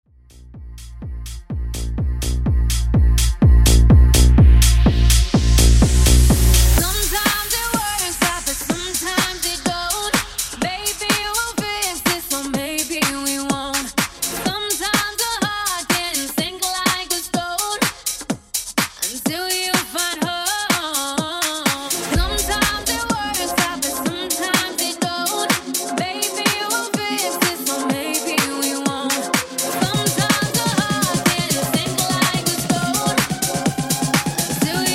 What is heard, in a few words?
Top40 Tech House